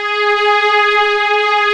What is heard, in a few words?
Textural Strings